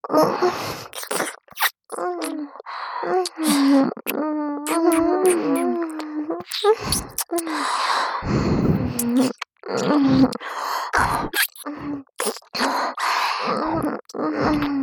両耳舐め; 先輩保健委員の両耳舐め; SEあり

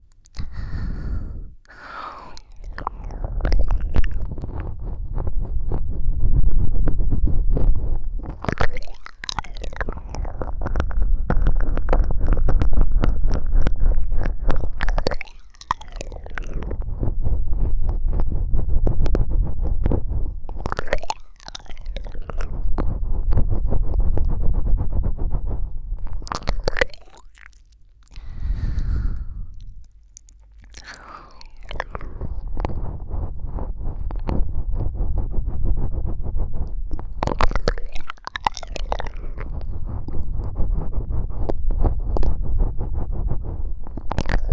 舌を捻じ込むコース

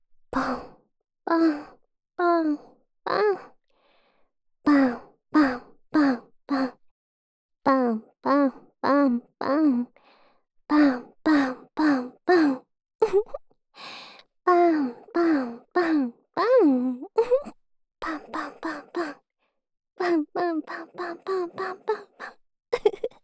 ぱんぱん

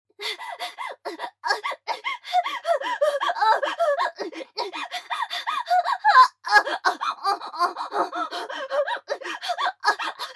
息遣い 加速; A-14 ヘレナにアナルファック中・息遣い・加速; アスティリア

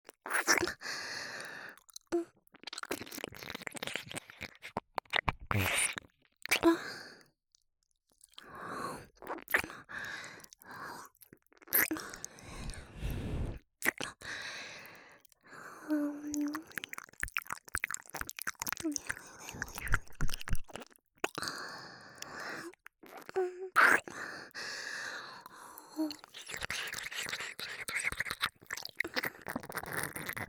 左耳舐め, ASMR

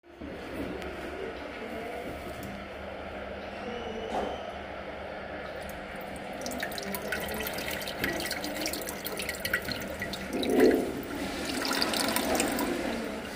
ショッピングモールのおトイレで オシッコですッ

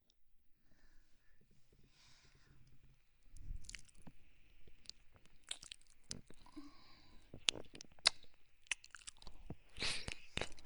お姉さんの唾液たっぷりな癒しの耳舐めASMR

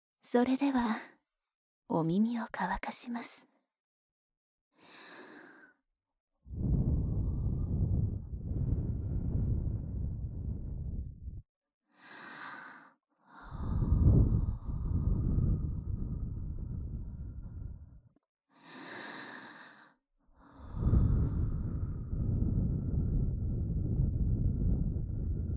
右 耳ふー, ASMR; 耳かき小分けトラック

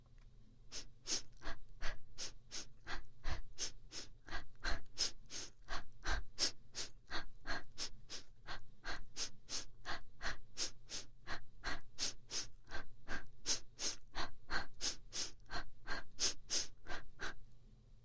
ランニング呼吸右（早め）; 呼吸音足音なし; 右耳用

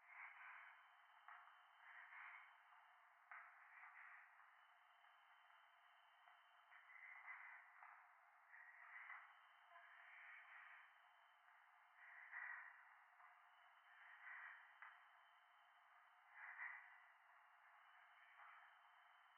教会 左耳密着吐息 山奥, ASMR

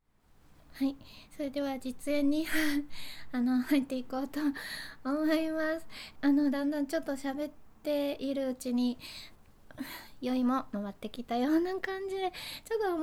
実演オナニー 無編集

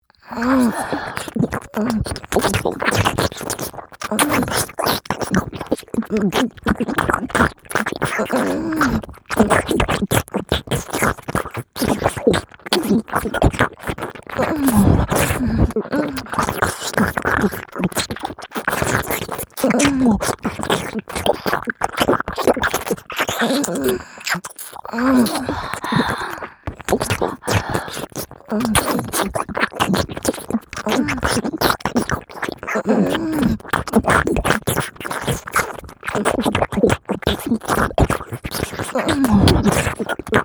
左右同時耳穴舌ピストン

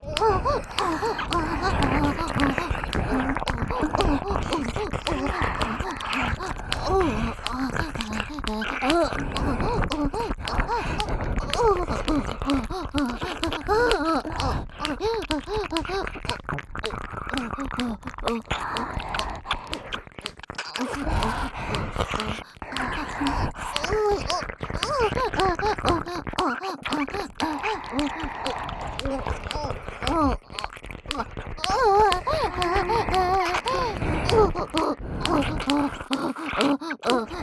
オホ喘ぎ ＋ 両耳れろれろ舐め; おまけループ（オナサポ）; 3WAYループ; SE有

